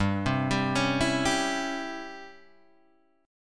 Db Minor Major 7th Flat 5th G

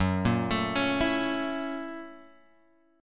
F sharp 7th Sharp 9th; F#7#9 Chord {2 1 X 2 2 0} arpeggiated

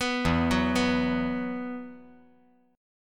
E Suspended 2nd; 4,4,2,2

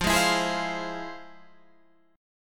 F Minor Major 7th, F, Guitar, Open G

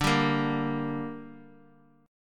D Suspended 4th Sharp 5th D down, chord

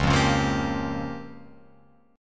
Db 6th Flat 5th Db down Guitar Open F; Db6b5 strummed; JGuitar

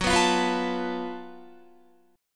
Gb Minor Major 7th Double Flat 5th Gb Guitar; GbmM7bb5 strummed; 14,14,15,16,17,16; 4E,4A,5D,5G,5C,6F

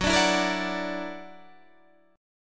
Gb Augmented 7th Gb

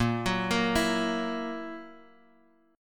F sharp 7th, guitar

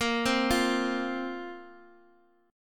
A sharp Suspended 2nd down

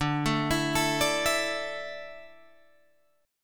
D Suspended 2nd D down Guitar Open G

